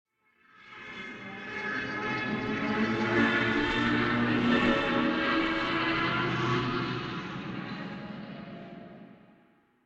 cave, ambient; Minecraft

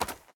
snow run, step; Minecraft; Remastered

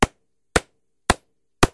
craft hammer; ambient

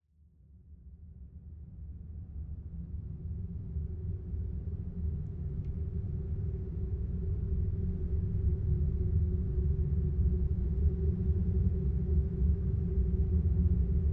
cave, ambient underground

